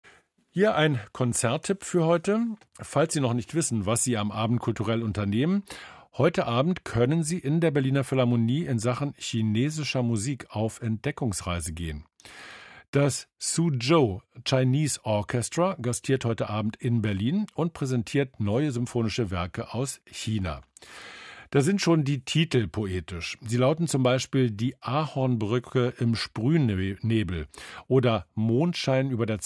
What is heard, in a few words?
Chinese Orchestra; Suzhou Chinese Orchestra; rbbKultur Empfehlung